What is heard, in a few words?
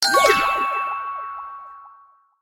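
magic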